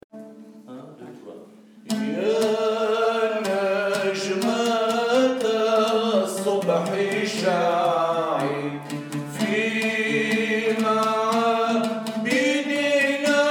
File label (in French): chants maronites